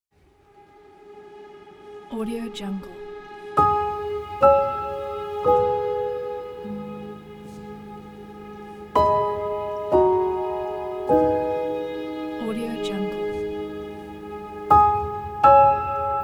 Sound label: cinematic minimalistic piano movement